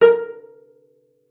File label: cello pizz A#4; 90 s Sample CDs; Xsample VOL-5